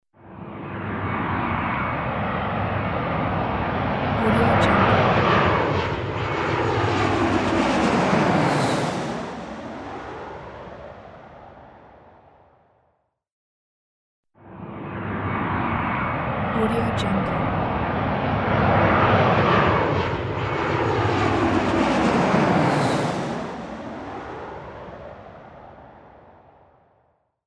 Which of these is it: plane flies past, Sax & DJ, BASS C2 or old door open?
plane flies past